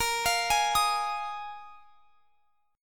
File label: Bb 7th Suspended 4th Bb; arpeggiated